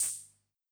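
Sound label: Hi-Hat Open, Luxor Passet; Drums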